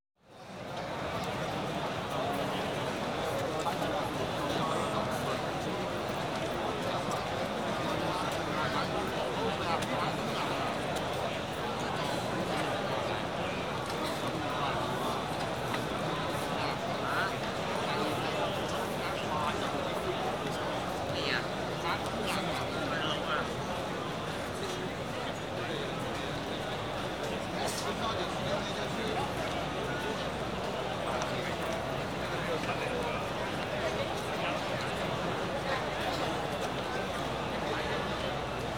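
Crowd Race Meeting Hong Kong, Exterior Atmosphere Ambience With People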